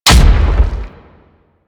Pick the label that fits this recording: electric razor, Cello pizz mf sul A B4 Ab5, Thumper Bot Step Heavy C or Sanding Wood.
Thumper Bot Step Heavy C